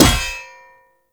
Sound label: Blunt Heavy Impact Metal; Weapon Impacts; Player; Environment